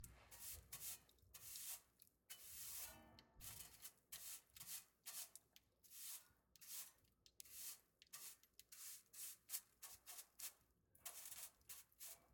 Woodstove Tools, Whisk Broom And Dust Pan; UberDuo; The Wood Stove Audio Prop Set

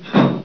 ladder, player; hl2

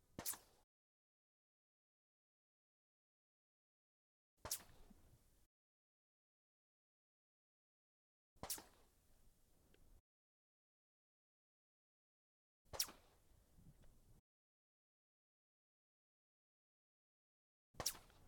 MP 40, Firing, 250m, submachine gun; Holophone; Pole Position

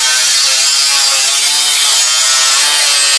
spin loop highest, manhack; hl2

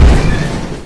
squeek stop; hl2

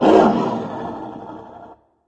angry, antlion guard; hl2

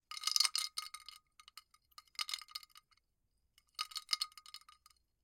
Ice Cubes, Glass, Tumbler, Water, Swirl, Rattle; Kitchen

